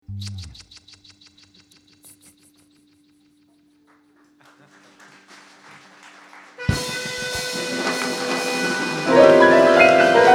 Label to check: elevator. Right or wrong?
Wrong